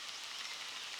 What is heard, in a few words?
Boat Noise